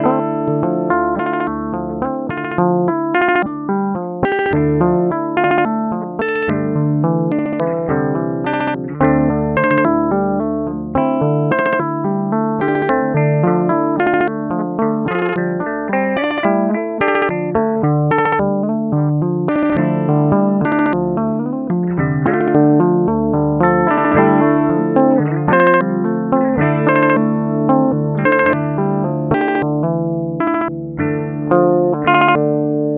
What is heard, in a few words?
Stutter; Ottobit